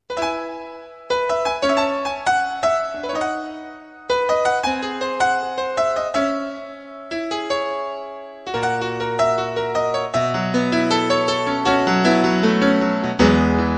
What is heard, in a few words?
Piano